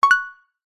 multimedia notification ui simple success tone

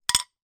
foley broken dish piece down on other; zapsplat

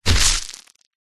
impacts body fall hit ground stones dirt

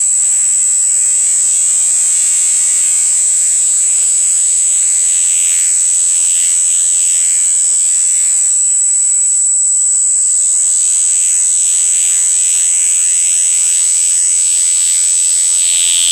glitched tones Interference

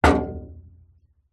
impacts metal trailer side hit knock

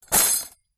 household cutlery metal in plastic tub bash

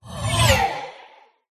whoosh; Audio Mangler

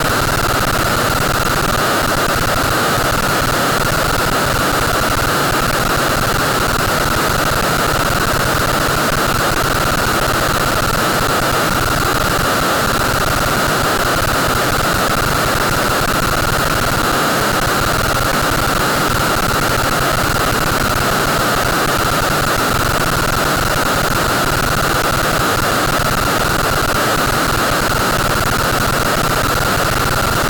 glitched tones Harsh Noisescape

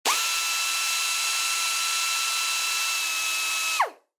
Pneumatic Tool Drill Not Muffled Long; noise creations

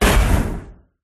Fire Spell Inferno Bomb; Sound Spark

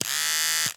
Electric Razor Turn On Run Turn Off Short

